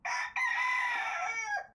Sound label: call, player; fowl-play